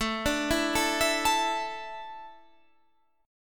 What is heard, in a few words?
A Suspended 4th, Guitar Standard; Asus4 arpeggiated; JGuitar